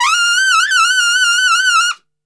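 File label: F 3 trumpet shake, Brass; Brass Super Section; TRP SHAKE; Best Service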